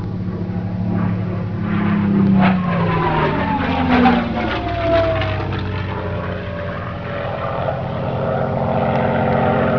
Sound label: mustang piper, aerei; trasporti